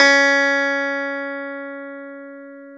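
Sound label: clavinet; Roland LCDP10 Keys of the 60s and 70s 2; Clav DB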